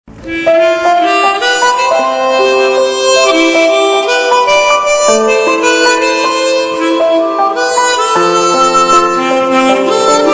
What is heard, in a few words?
hermonica remix